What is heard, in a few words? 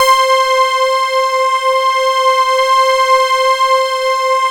C5 prophet pad; Sound Gallery - Charged